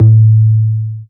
Bass Root A

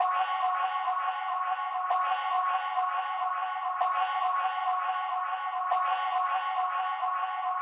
126BPM FX; Vengeance Samples; Malta Kit

